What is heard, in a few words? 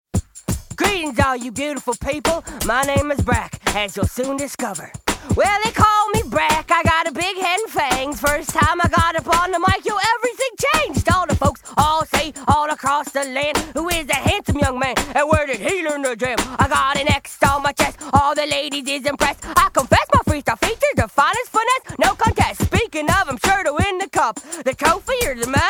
Scratch Vocal